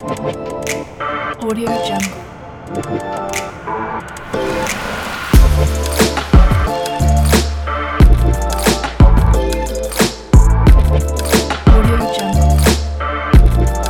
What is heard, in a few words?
chill